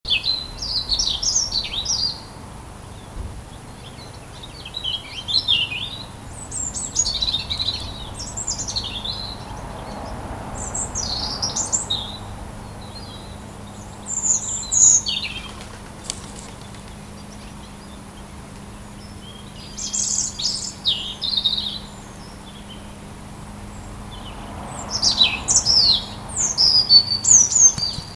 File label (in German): Rotkehlchen Gesang, Wildtiere in Europa